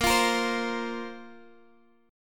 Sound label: Bb Suspended 2nd Bb Guitar Standard; Bbsus2 strummed; x,x,8,10,11,8; JGuitar